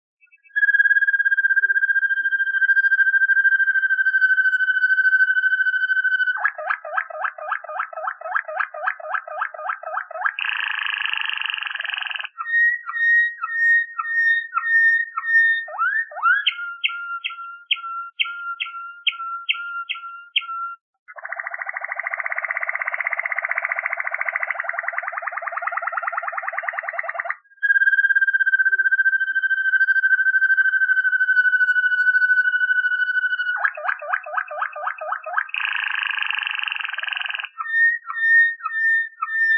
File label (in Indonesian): variasi suara kenari waterslager